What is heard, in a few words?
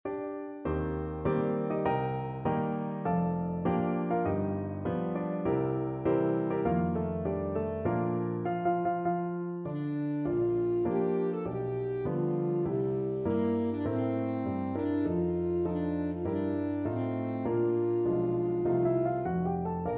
saxophone